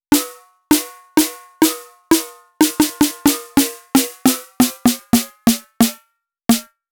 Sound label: snare rack